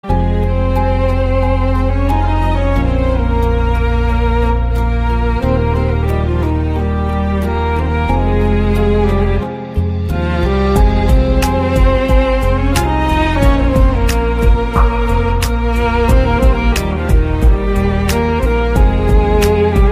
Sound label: End Music